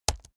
keyboard laptop space press slow hard

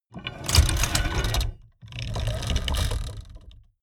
mechanics medium trail

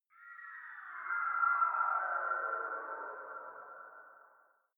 whistling chirping

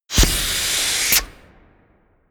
whoosh air

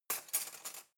cutlery knife drawer rattle soft

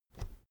keyboard external space push soft

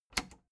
plastic button latch loose click enable fast

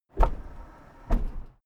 car lift down

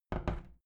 window plastic squeak open soft

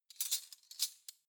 cutlery spoons handle

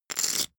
poker chip stack big rattle

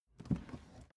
suitcase open case

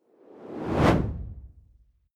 whoosh simple hard incoming

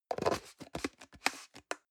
plastic can small lid screw close medium

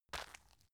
tissue package pick up

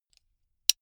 buckle plastic insert close soft

